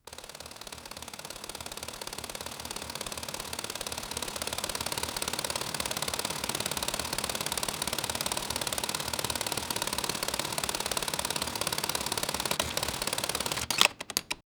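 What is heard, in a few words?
automatic Kalashnikov 47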